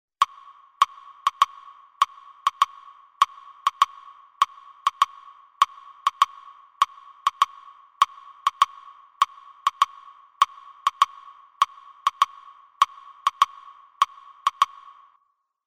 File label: ear training